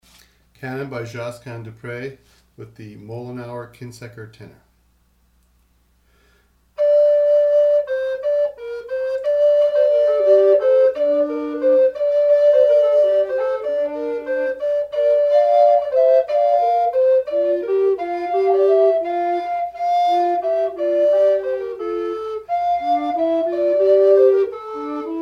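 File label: Mollenhauer Kynseker Tenors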